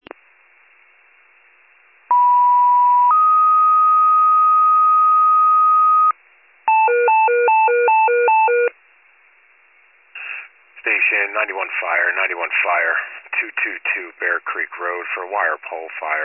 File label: fire, pager alert